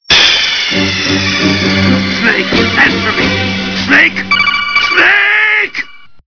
death; youngsnake

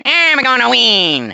happy; tf; waluigi

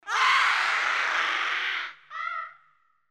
witch, zombie; dod

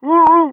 jump; freak fortress 2; meemswarm; pyro

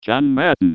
battle cry; John Madden

